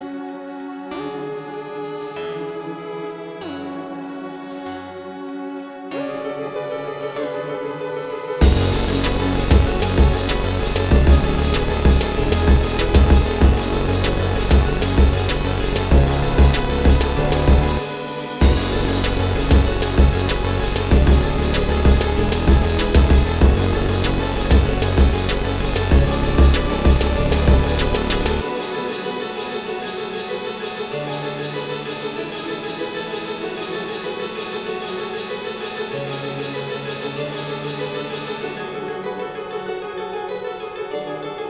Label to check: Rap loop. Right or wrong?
Right